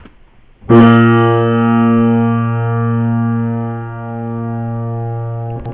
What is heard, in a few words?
piano Bb2